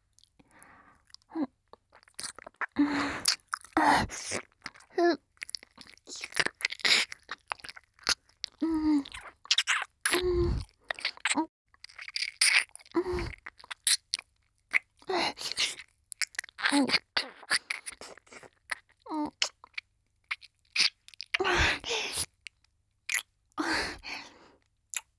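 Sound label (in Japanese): 関西弁の耳舐めのみ 左耳; 遊郭で見つけた関西弁の天使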